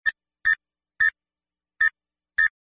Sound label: comm telemetry graphic beeps